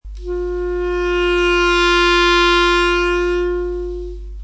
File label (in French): sol, clarinet